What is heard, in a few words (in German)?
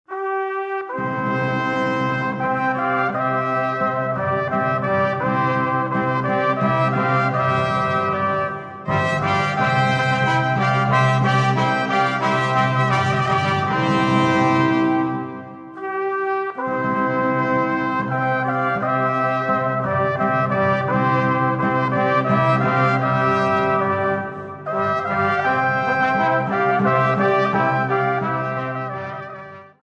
barocktrompeten